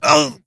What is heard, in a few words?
wound; Skadi The Ruthless